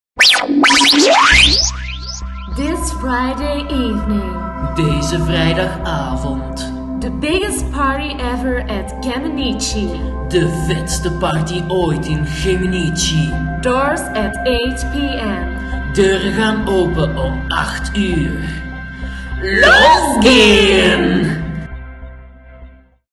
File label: Jingle